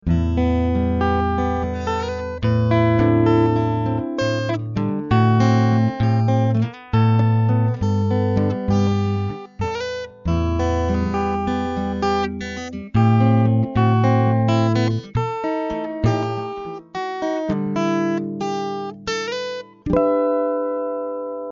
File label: solo